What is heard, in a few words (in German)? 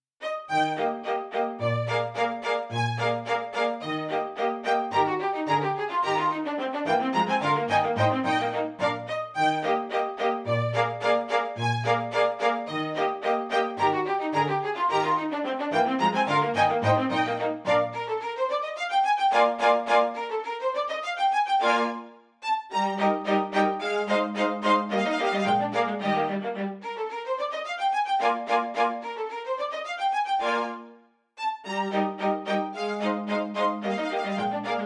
Contretanze fur zwei Violinen und Bas Tanz; Zwolf Contretanze